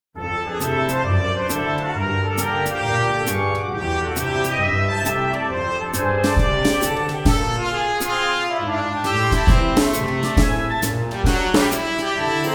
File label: casino Loop; hitotooki